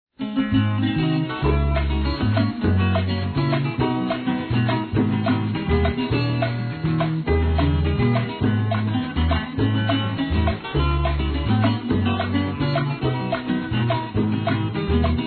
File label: Solina